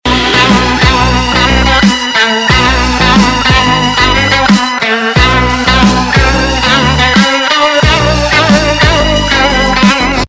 Piano With Trumpet Theme Ringtone; Bollywood; Kabali

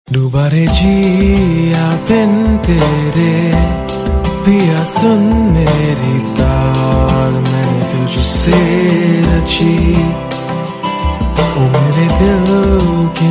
Male; TV Serial Tone; Channel V

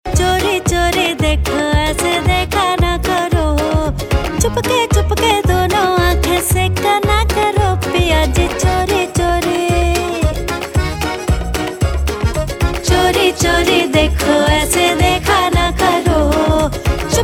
Ringtone, Bollywood; Rangoon

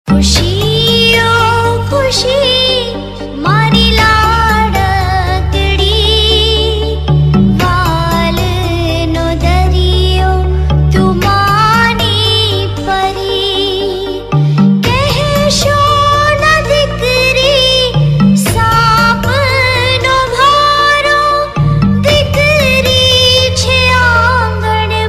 Gujarati Tune; TV Serial Tone; SAB TV; Taarak Mehta Ka Ooltah Chashma

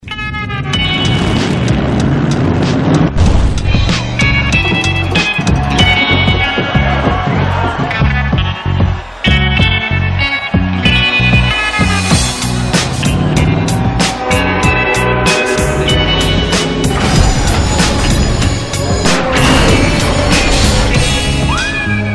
music whistle; Amazing BMW X5 TV ad

